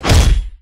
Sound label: sledgehammer hit; Weapons